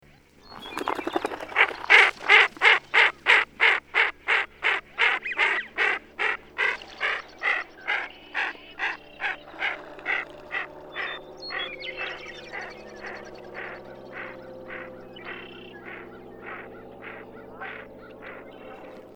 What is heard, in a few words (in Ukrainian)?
sviyaz golos samki